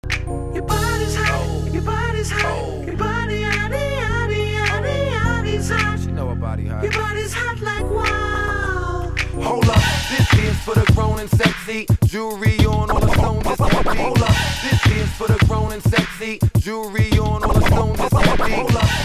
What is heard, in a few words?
juggle scratch